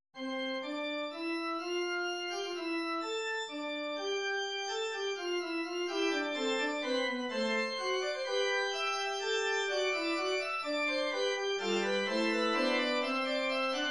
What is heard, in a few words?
classical fugue, midi